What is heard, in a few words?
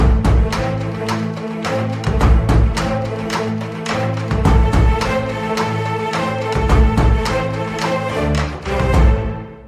theme; singham